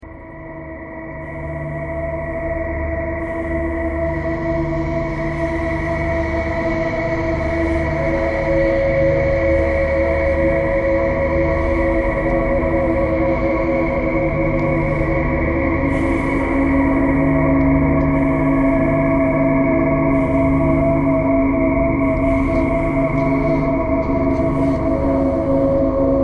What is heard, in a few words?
thread pentatonic